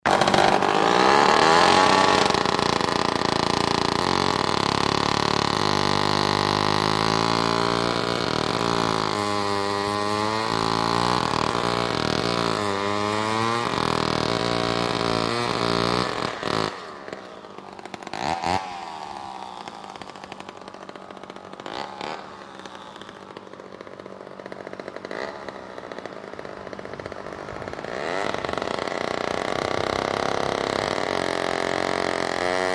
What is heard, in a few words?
Chain Saw Idling